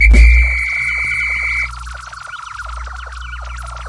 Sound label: whistle